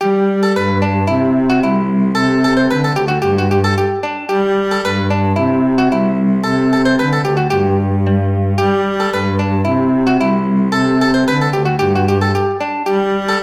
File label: La Rose, mandolin cello duet